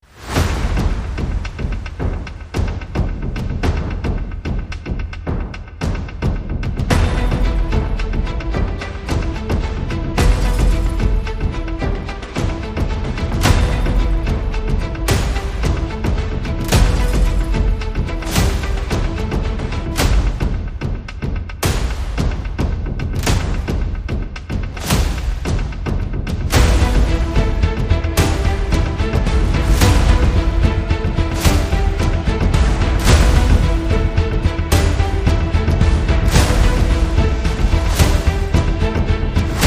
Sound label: No Piano Ping